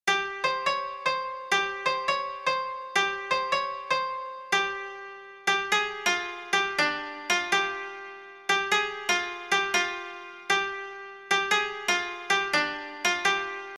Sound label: song on mobile piano; kantara